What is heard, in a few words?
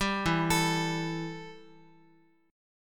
E Minor Double Flat 5th E, Guitar; arpeggiated; JGuitar